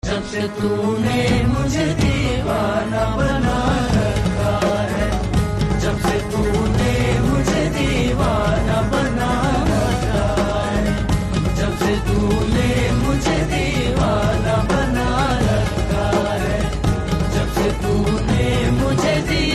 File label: Ringtone